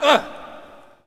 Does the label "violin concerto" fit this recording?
No